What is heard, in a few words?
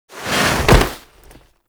Grab Foley; Cinematics; Tenno; Warframe; TheNewWar; Throne Room